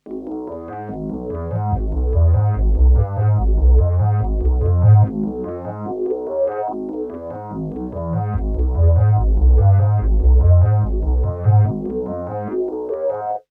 MELODY; Chillout; Future Music

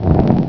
Gate Sound; Curse of Dragor

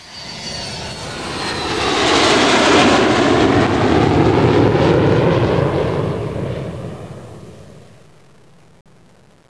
fly jet, paper planes; WordPerfect Main Street - Paper Planes

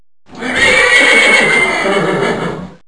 HORS HIT

